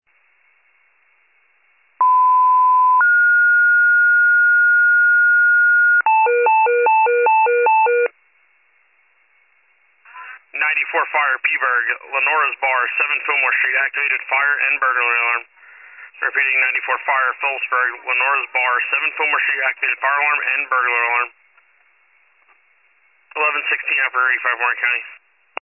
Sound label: fire, pager alert